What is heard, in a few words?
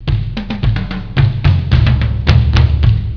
drums